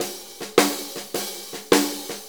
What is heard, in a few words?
Blues Loop, Drum Tools, Full Kit Loops